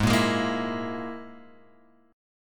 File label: Ab Suspended 2nd Sharp 5th Ab Guitar Open G